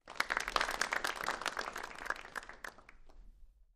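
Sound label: Crowd 50 Short Medium Burst, small interior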